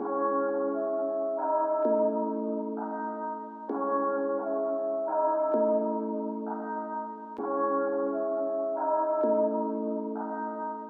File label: ambient vibe